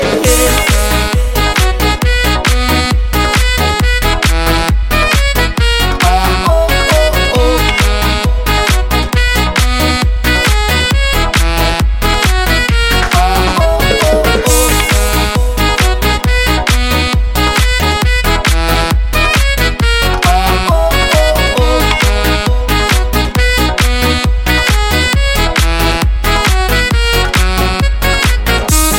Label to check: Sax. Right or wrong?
Right